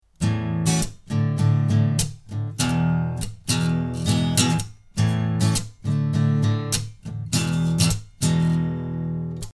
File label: Git modern